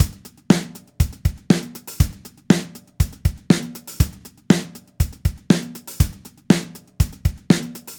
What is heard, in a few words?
16beat